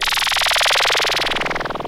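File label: bubbles synth; SoundFactory; FX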